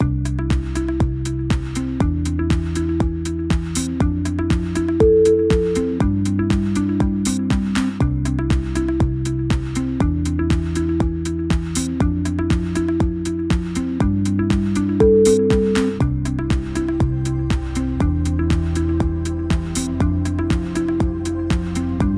corporate upbeat

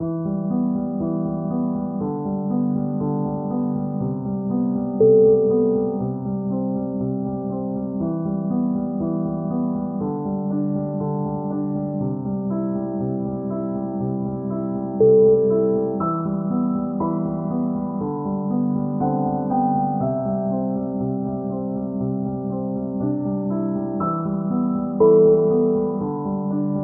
in melancholic mood

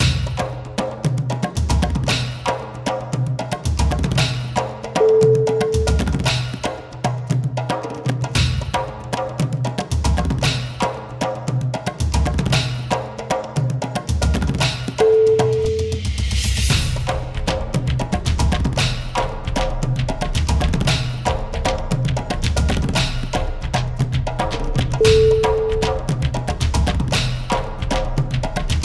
energetic tribal drums

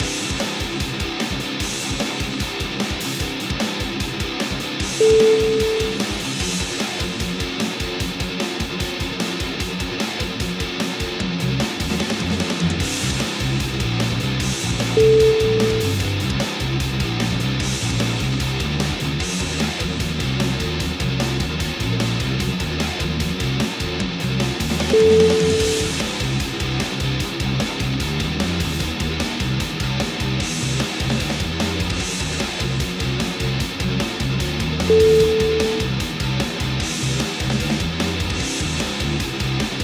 rocking metal groover

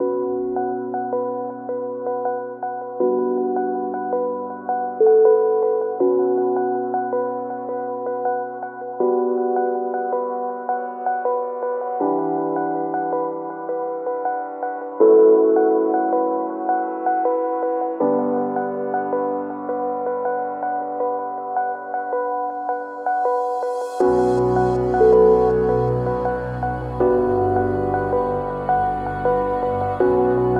in dramatic moment

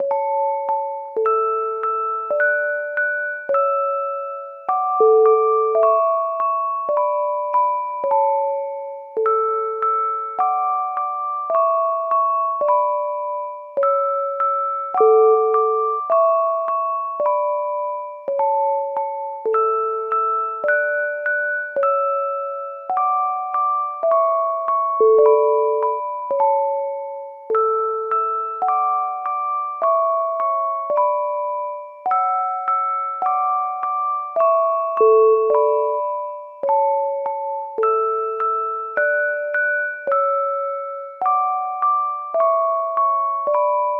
twinkle twinkle little star

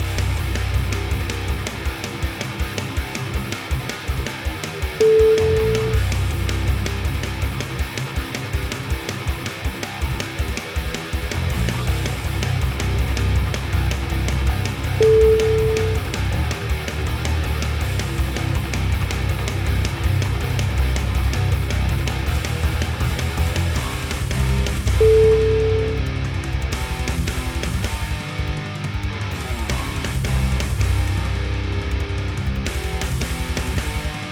rock

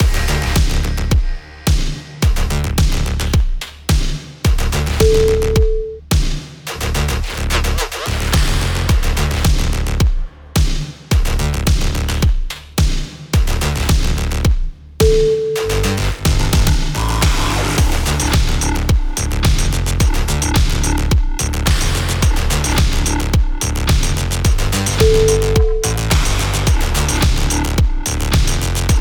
electronica punch

